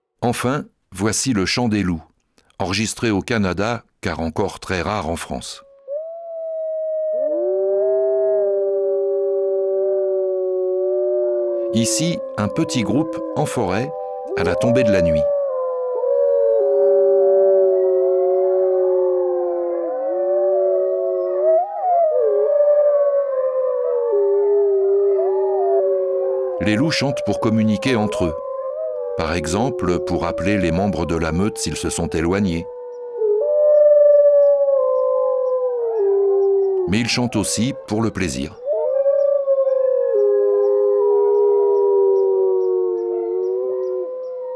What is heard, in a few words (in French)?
Loup; ABC de la nature